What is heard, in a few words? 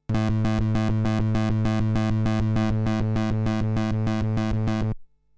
Moog steel vintage; Warm Audio TB12